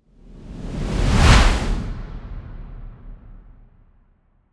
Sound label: whoosh